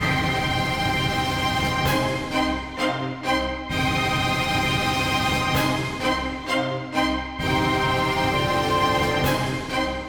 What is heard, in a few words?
Orchestra Loop; McRoMusic; Summoning Circle